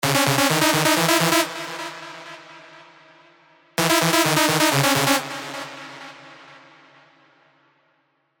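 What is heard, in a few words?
lead, Kaboom, spire; alonso fireworks stand 1776 edition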